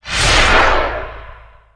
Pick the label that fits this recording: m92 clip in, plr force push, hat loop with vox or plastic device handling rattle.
plr force push